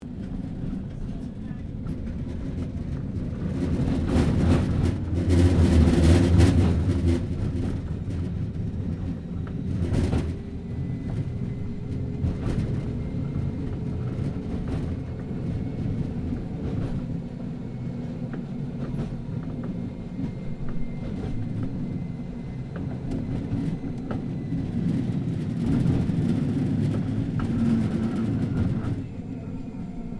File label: Tram Melbourne W Class Interior Start Stop Rattling; Melbourne Trams; Streetcars; Vehicles